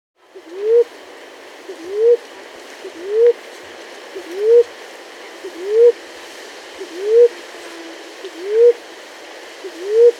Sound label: Maroon-Chested Ground-Dove (Claravis Mondetoura), Andean Birds; S. America